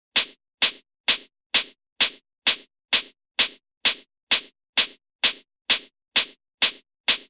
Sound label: Drum Loop hh 130BPM